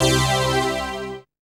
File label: dance chord